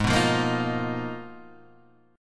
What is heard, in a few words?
Ab Minor 6th Add 9th, Ab, Guitar, Open G